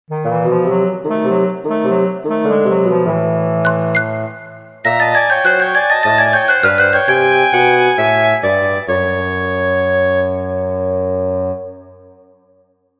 Wobbly, Classic Cartoon Drinking or Drunk woodwind section; Funny Musical